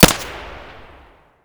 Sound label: pm muzzle mono distant